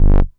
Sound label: Bass Multi Rev G; Vengeance Samples